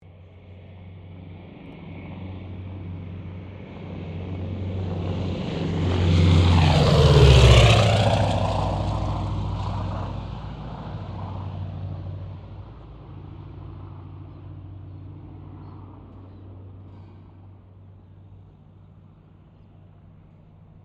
AIRCRAFT LOCKHEED HUDSON PASS, twin engine light bomber; 1941; Airplanes; Vehicles